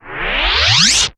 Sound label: Scratching FX